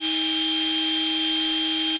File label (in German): emotor